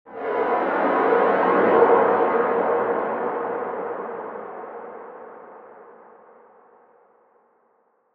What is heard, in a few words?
Atonal Depths, Psytrance